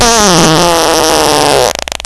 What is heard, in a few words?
fart